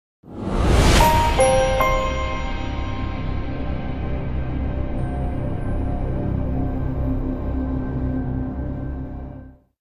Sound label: starz audio logo